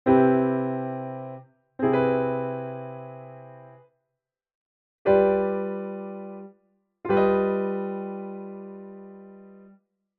aug sevenths

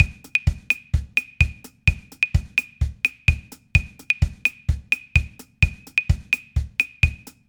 son clave

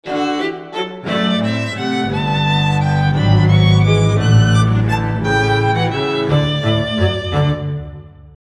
styles classic

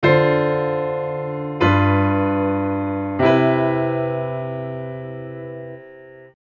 shell voicing

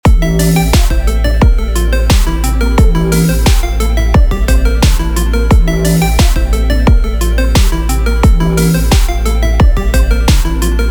dark bgm phrygian

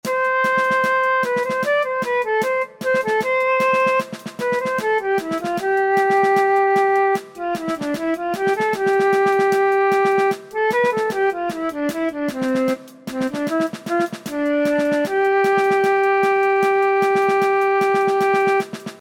bolero poly

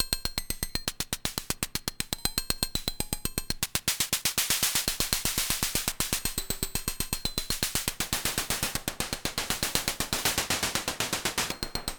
exciter, u-he zebra3

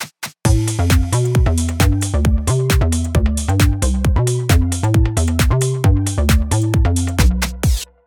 tonality diatonic

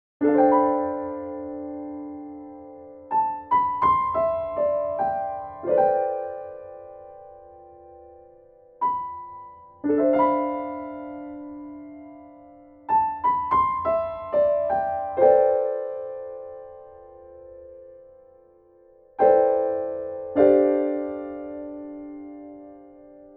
diatonic only

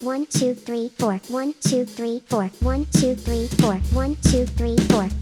backbeat jazz

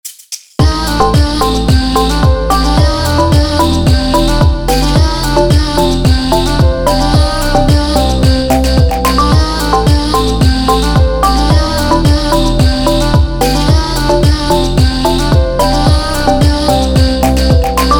Bluenote EDM

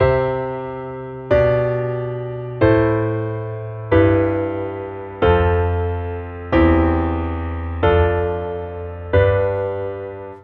piano cannon bunsu

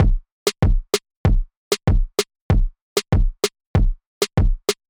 dembow

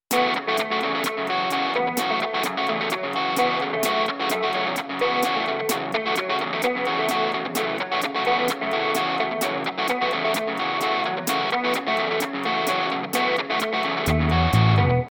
poly mix

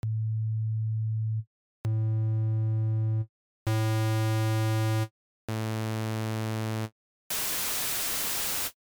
synth waveforms

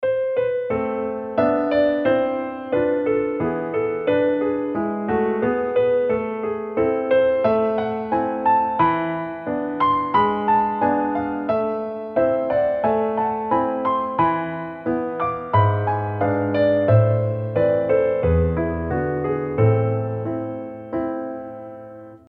minor piano melodic

